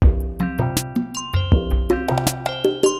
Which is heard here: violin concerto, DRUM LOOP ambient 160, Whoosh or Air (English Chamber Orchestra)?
DRUM LOOP ambient 160